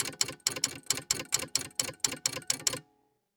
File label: Typewriter; facts channel